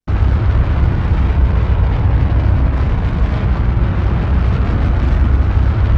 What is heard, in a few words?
Thruster